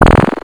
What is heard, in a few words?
No Bonus